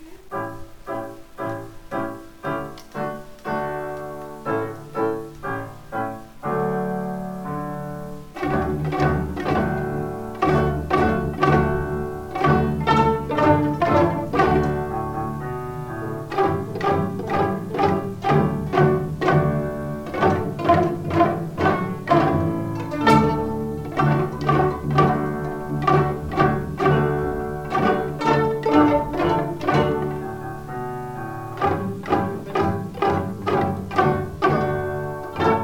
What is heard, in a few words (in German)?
Jingle ohne Hey